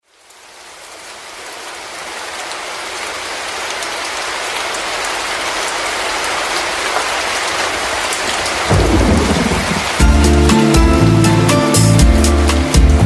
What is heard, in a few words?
Summer Rain Sax